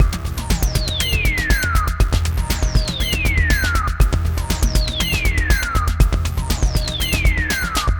Darkwave, Processed Percussion

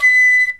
WOODWINDS

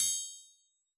triangle open, Yamaha DJX Rock Kit; 144 Rock Kit

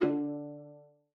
D3, VIOLA PIZZ; Xsample VOL-5; 90 s Sample CDs

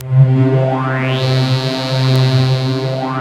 SWP PAD C3, D70 SYNTH; East West; Global Sounds

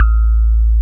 TINE; DIGIJAZZ; East West - Twisted Textures-BT CD1

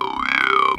Talk Box "oh yea"

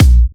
Electro Kicks

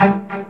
PIZZ G, Strings

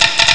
drum; Amiga Soundtracker Sample Packs

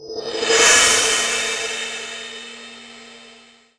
Zil Crescendo, Drum Hits; Zero-G; Soundclash

